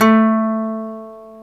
GTR nylon

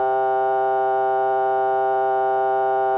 Synth C, Synth Prophet One Shots; Electronisounds

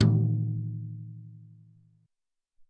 Brs Tom, Alesis SR-18

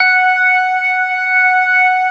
ORGAN SYNTH; InVision Interactive; Keith Emerson

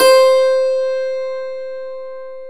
dulcimer C4; Stereo Dulcimer

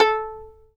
fiddle pizz A4 L; Sampleheads; Whole Lotta Country 1 & 2

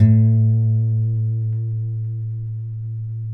Finger A 2 R, Guitars; Spectrasonics; Hans Zimmer Guitars VOL-1

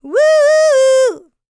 LICKS, Vocal R&B Dance; LT DANCE

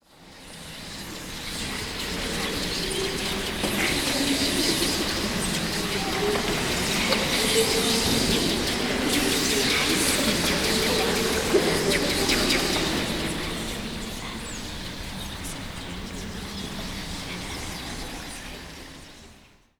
CHATTER

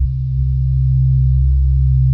GLOWY ORGS, pads; Pro-Rec - Synthsonic

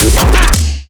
huge fill

